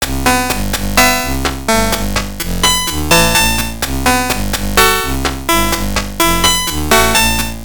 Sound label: chiptune